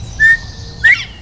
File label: bobwhite, birds